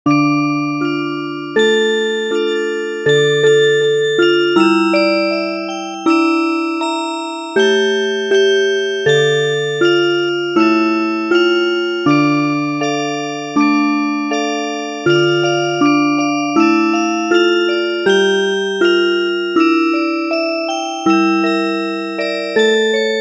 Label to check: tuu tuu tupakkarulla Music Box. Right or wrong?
Right